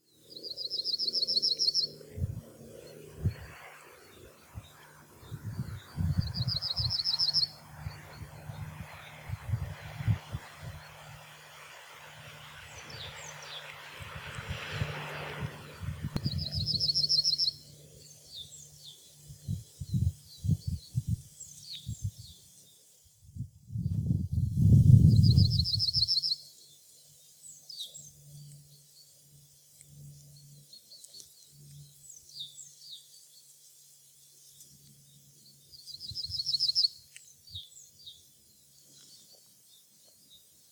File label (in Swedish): gulsparv; Grimsvik, Urshult 19 mars 2016; fågelljud